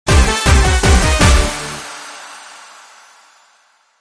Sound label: Balkanians (Modern Remix)